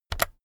keyboard external key press very fast

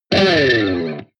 electric guitar distorted slide down

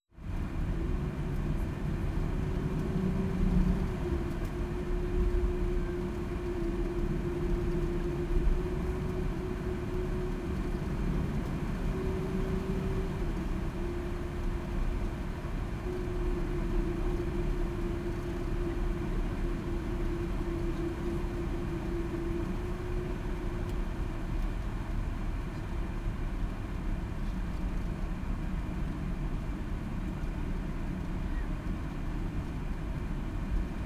airplane cabin on runway

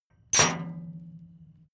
metal chain impact container

